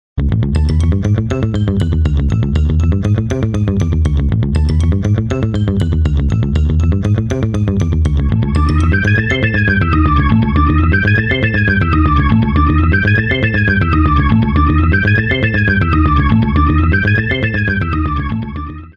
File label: sixties groove